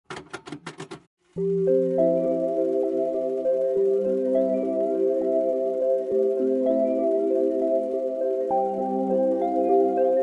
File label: childhood memories calm ambient music